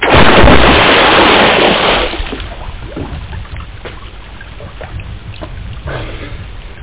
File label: in water; Hottest 6 (1996)(PDSoft)